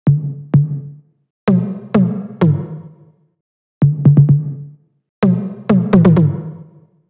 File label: Perc 128